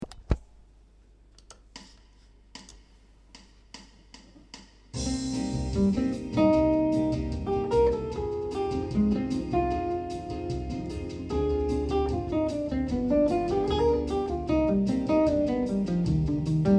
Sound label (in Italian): bossa in blu; original jazz standards